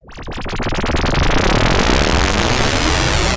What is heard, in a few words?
Rise FX C3, Club Techno; Zero-G